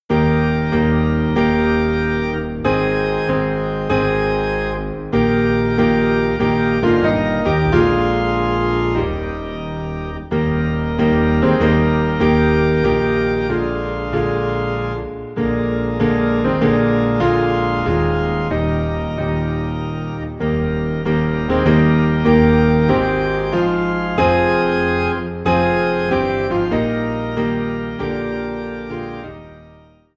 Piano and Organ Low in E Flat; Bring Them In

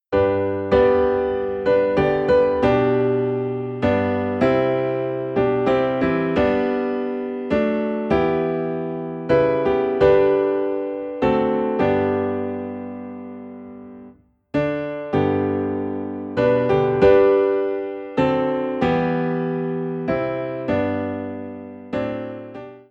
Amazing Grace Piano in G

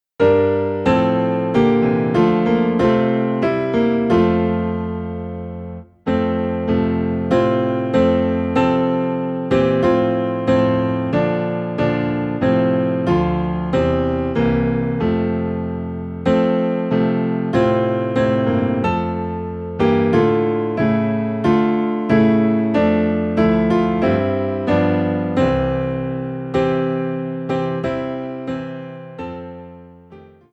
Piano Low in F

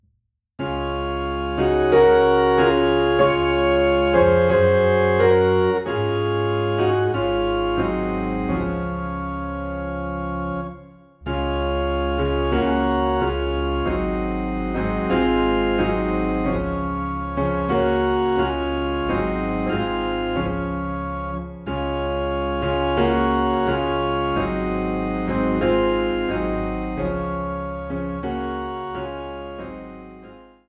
Piano and Organ in D Flat; I Surrender All

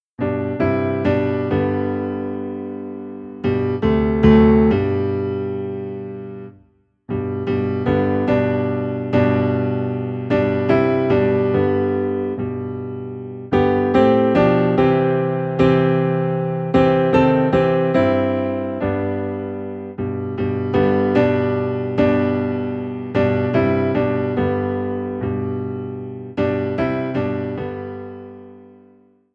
Piano Low in G

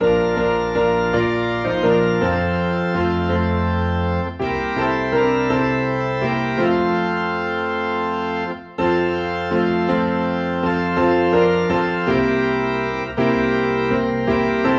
Piano and Organ in F

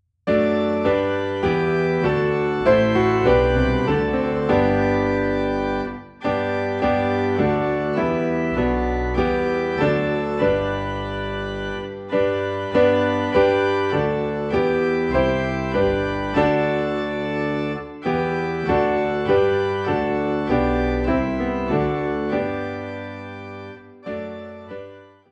Piano and Organ - High in G; Praise God From Whom All Blessings Flow (Doxology)

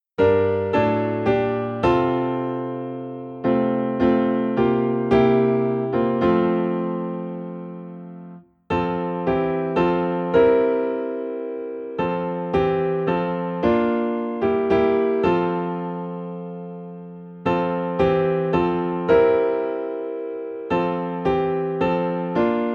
Be Still My Soul Piano in F

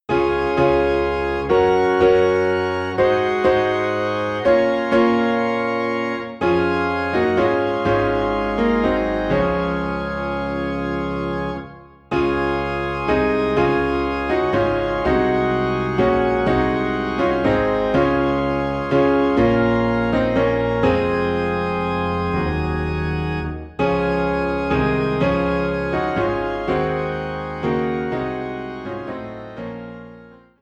In a Cave, Piano and Organ in D Flat; christmas

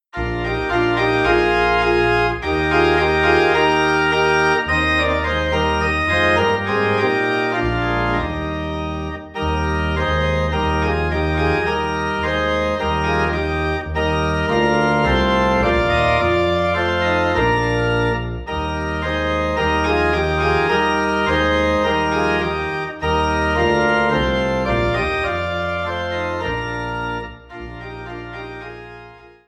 Organ in E Flat; Lord Dismiss Us With Thy Blessing

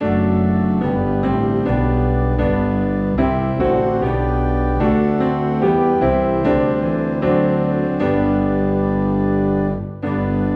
Piano and Organ Low in G; A Charge to Keep I Have

